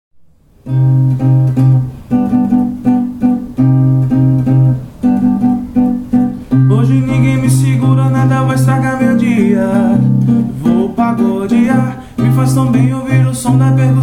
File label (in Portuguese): eu sou do samba